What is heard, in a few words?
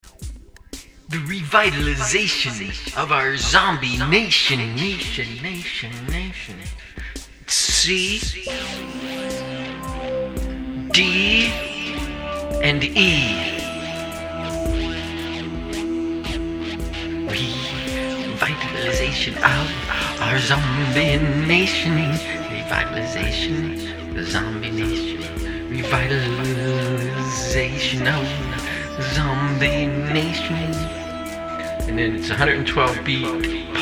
Soundcheck